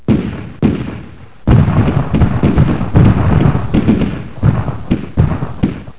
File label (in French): canons; Reperages 94; tortue